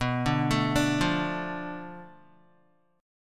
B Minor 7th Flat 5th B, Guitar; Bm7b5 arpeggiated

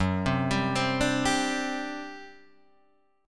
G Major 7th Suspended 4th, Gb

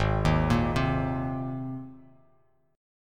Ab Major Add 9th Ab Bass Standard; Abadd9 arpeggiated; JGuitar

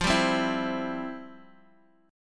F Major 7th Suspended 2nd, F, down, Guitar

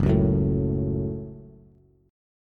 Gb Minor Gb Bass; strummed; JGuitar

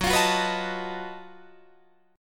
Gb Minor Major 13th Gb down Guitar DADGAD

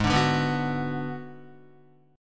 G sharp Minor 7th Flat 5th G sharp down Guitar; G#m7b5 strummed

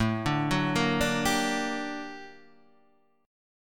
G Minor Major 7th, A, guitar chord; GmM7/A Chord {5 5 4 3 3 3} arpeggiated

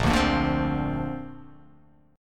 A sharp Major Add 9th

